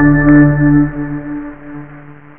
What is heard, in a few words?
BING